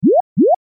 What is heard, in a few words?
Touch poyo poyo